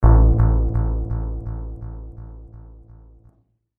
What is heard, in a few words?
echo moog, scary sounds